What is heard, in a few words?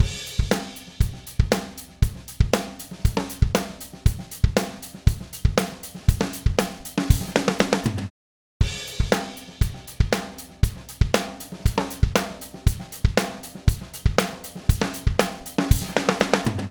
Snare